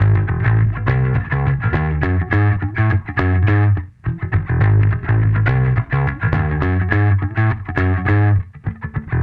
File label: Bass